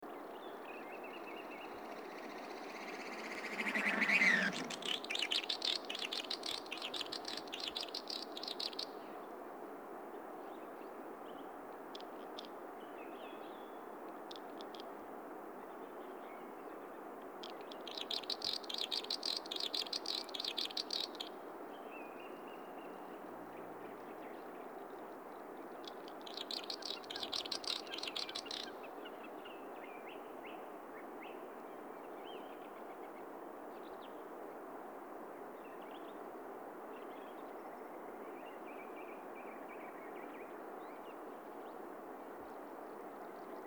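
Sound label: solitary snipe voice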